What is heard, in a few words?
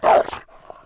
Wolf Fort Vox; Civ4 beyond sword